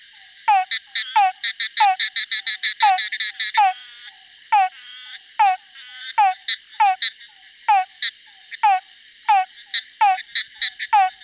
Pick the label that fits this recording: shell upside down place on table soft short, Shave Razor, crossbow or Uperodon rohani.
Uperodon rohani